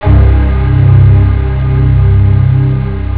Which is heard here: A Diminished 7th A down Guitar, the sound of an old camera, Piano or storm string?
storm string